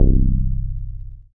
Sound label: BAS MKS-50, Roland